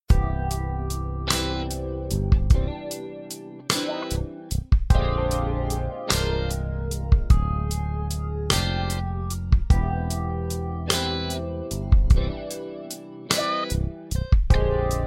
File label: Lydian Flat 7 Groove